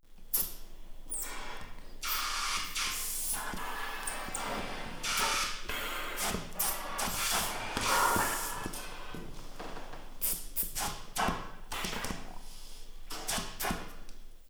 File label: ventilation tone